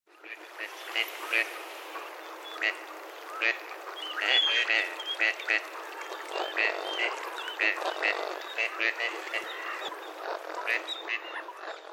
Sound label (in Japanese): okayoshi, soka